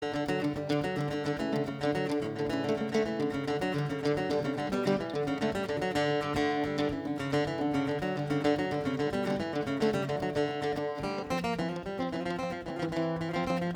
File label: Harmonium